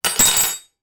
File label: teaspoon drop down on surface, household